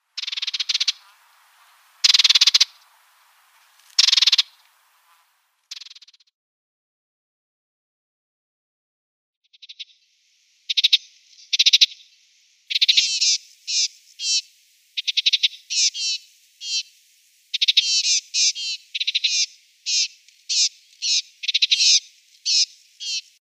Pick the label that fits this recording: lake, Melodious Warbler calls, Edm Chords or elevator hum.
Melodious Warbler calls